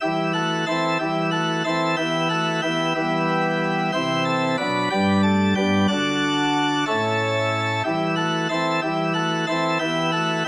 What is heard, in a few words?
oer the hill, organ